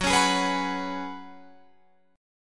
F sharp Suspended 2nd Suspended 4th, Guitar Open F; strummed